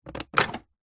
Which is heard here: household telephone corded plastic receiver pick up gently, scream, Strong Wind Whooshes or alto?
household telephone corded plastic receiver pick up gently